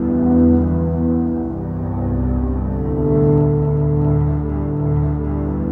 Acoustic Drone, Long Loops

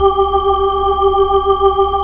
B-3 Chorus

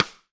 RIM MIX, AMBIENCE RIM

